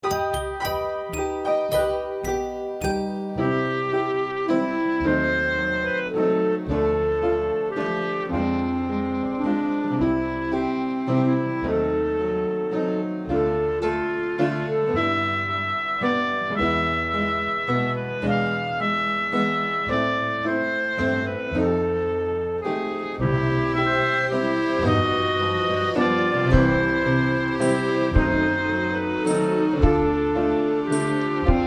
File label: Waltz